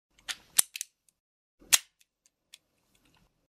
mirotvorec vzvedenie i spusk kurka bez patronov

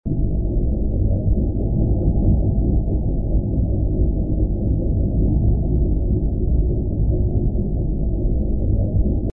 lodka pod vodoj; Звуки подводной лодки

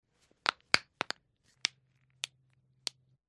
otdelnye pohrustyvanija

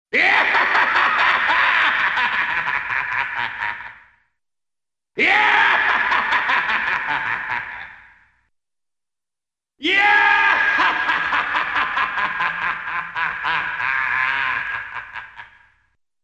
kinematograficheskij smeh sumasshedshego; Звуки сумасшедшего; идиотские звуки, которые издают психи в фильмах страшилках

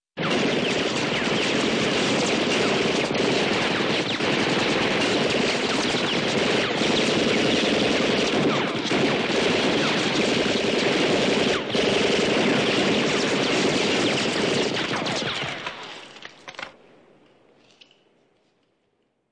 Avtomat s rikoshetami

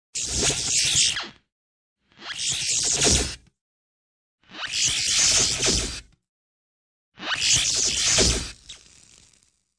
Varianty povorotov

